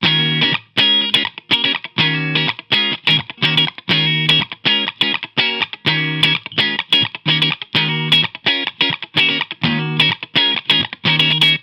WA12 DM24 Line Tone

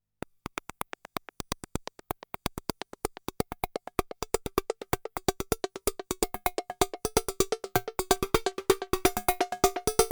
Xaoc Devices Sofia Ripple Percussion

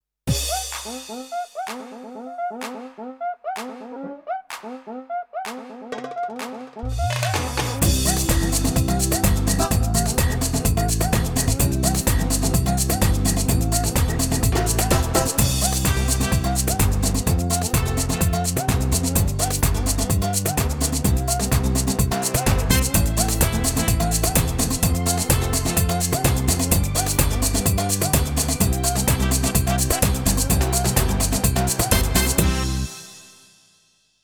Roland EA7 New Samba

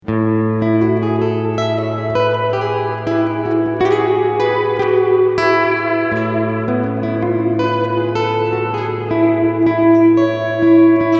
Pigtronix Cosmosis Preset Temple

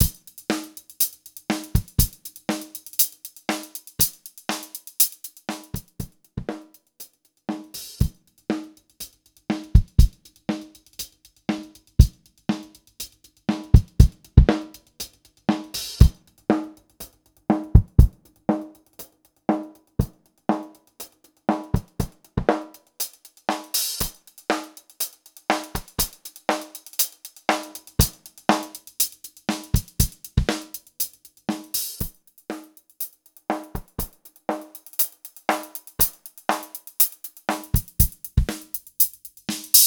ac drumloop sweeping

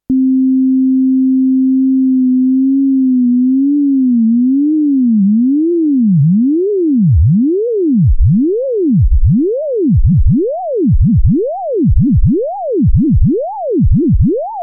linFM Reflekt Blofeld

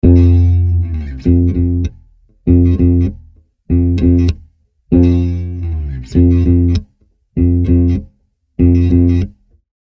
Bass Rhythmic Pedal; Scoring

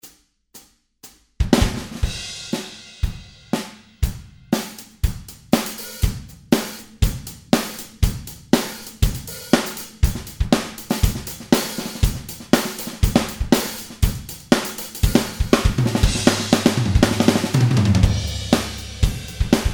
Pop Rock Straight

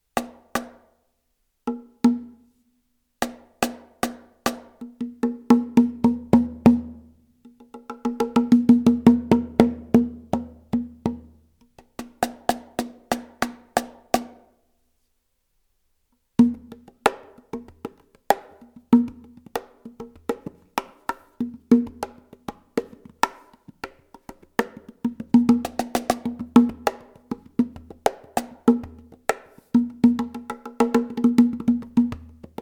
Meinl Cajon Test Slap Top

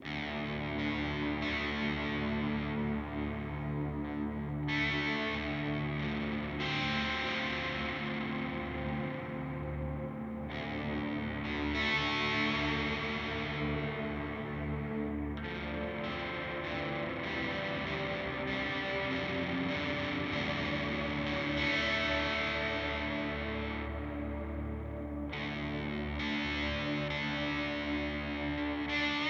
D R dark reverb; Walrus Audio Melee

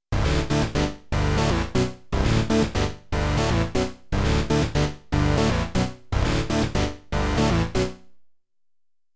Studio Electronics Quadnic Chord Process CV

